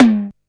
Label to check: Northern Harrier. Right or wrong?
Wrong